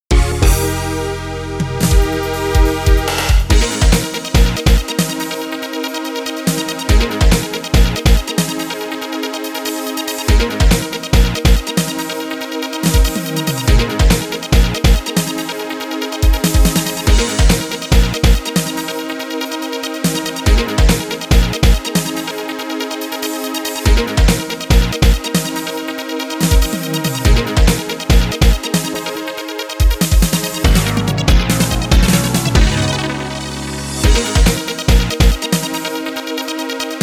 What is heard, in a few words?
Ground rhythm; Cyber Commando